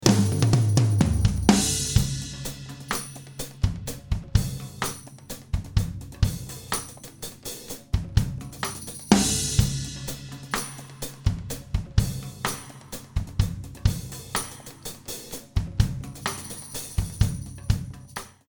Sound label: Drum Stem Dry Wet; Bob Clearmountain; Apogee plug-in